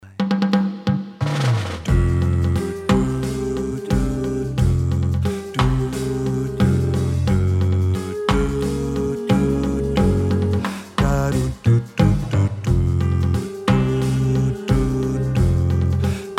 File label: Bass